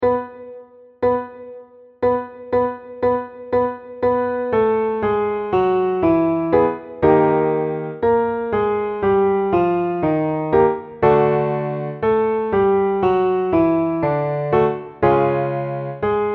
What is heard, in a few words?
Scales going down